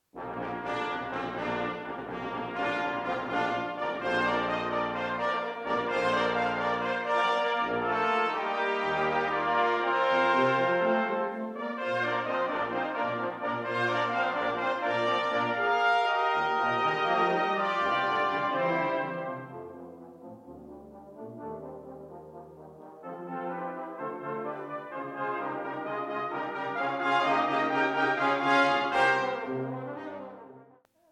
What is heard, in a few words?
Western Fanfare